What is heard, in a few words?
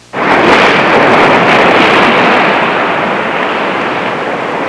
thunder crash